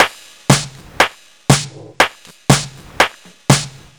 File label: Sleazy, Percussion, 120 BPM; Sonic Foundry (Sony Creative Software); Underground UK House & Electro